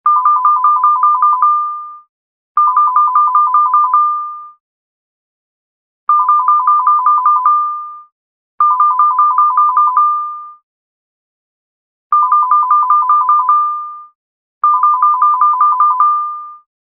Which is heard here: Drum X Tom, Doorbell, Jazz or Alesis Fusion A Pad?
Doorbell